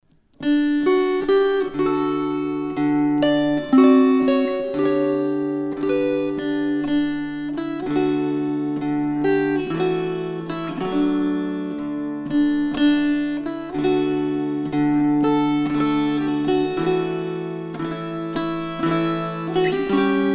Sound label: String Choir